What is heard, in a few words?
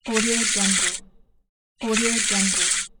deck of cards